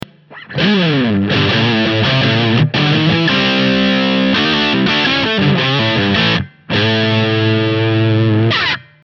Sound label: British Crunch